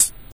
focused hihat D key, pedal hi-hat single hit tuned to the D note. Loudest frequency: 8011Hz; drum single hits one shots; hat samples